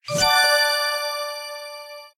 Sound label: power up granted; guodongrenwu